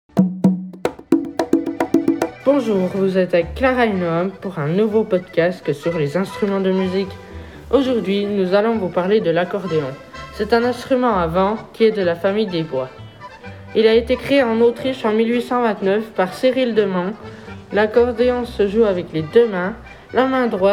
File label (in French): accordeon